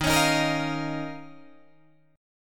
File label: D sharp 7th Suspended 2nd, Guitar, Drop D